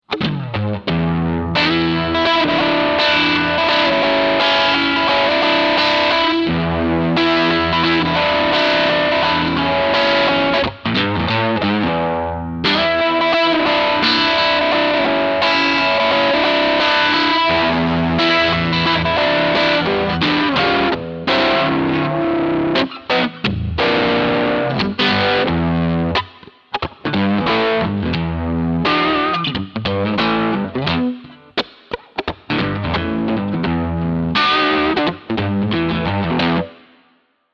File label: Chorus Modern